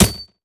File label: frag physics bounce gravel hard; gmod; viper